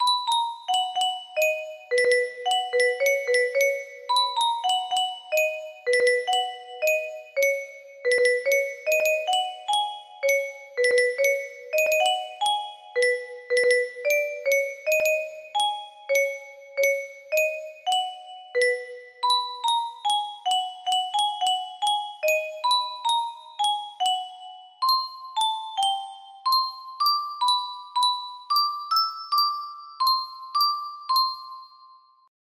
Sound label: melody